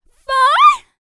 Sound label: Vocal, R&B & Dance, FEM. HUMAN